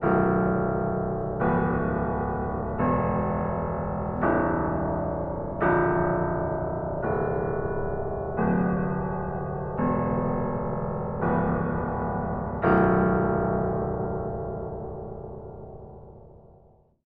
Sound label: piano midnight